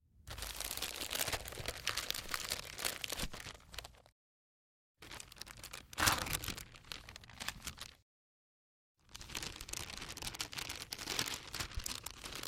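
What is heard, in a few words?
sounds of cellophane bag or paper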